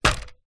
vehicles motorcycle helmet set down hard visor vibrate; zapsplat